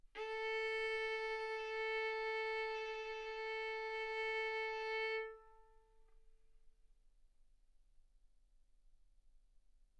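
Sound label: Violin arco mf A string A4 B4 stereo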